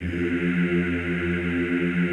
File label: EEH F1, Classical Choir; Best Service; Extended Classical Choir